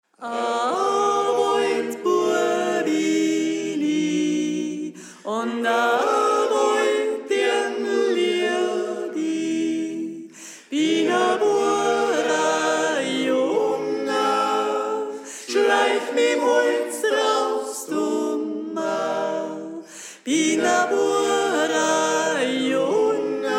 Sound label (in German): Sopran; A Waldbua Bin I